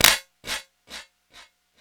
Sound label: SPACE CLAP; E-MU Formula 4000 Series Vol. 3; Analog Odyssey